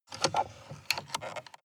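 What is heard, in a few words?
sports car interior drink holder close medium; verkehr-transport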